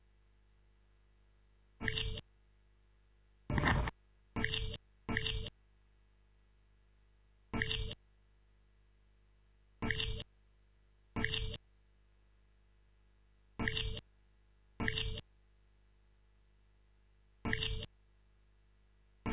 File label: stereo instr loop; rauschpartikel